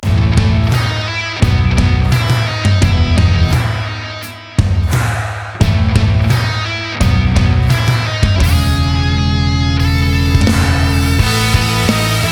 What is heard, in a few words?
Stadium Rock